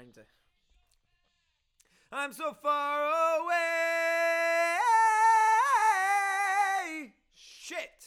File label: Chorus